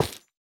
下界木悬挂告示牌破坏声

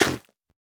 菌岩破坏声

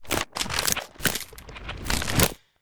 嘎枝抽动声

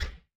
合成器合成失败声

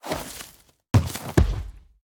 挖掘停止声; 嗅探兽

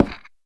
嘎枝之心放置声

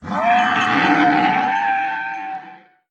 僵尸马死亡声

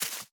杜鹃树叶破坏声

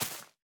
苔藓破坏声

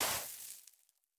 刷子刷沙完成声

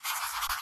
制图台绘制地图声

